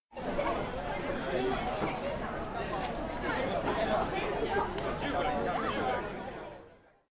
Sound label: market chatter; anemoi